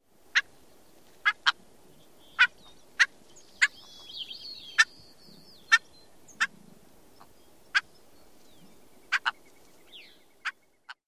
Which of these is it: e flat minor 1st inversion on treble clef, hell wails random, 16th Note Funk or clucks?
clucks